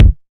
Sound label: original bass drum one shot g sharp key; Bitdepth 24